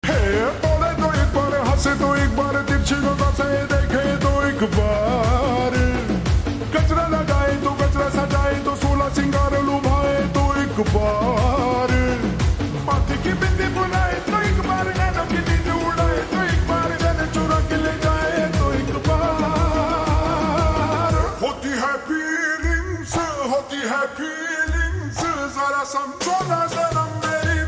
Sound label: Ringtone Slowed Reverb; pushpa 2